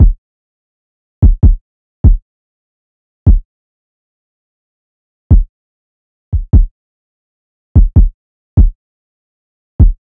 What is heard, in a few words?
Drum Loop, 147 BPM Kick; Loop Stems; Cymatics; Vibes II Drum Loop Collection; Sparkles